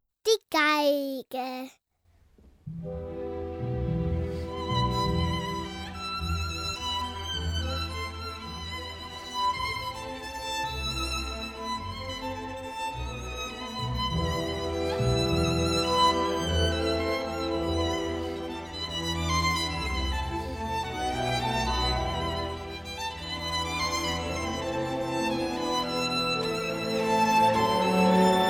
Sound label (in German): Violine; Bilder einer Ausstellung